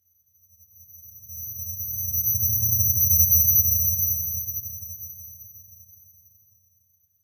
Ring High Harsh Passing Tones Like Ear Ringing - Bowed Chime; Hollywood Edge; sound designer's toolkit v2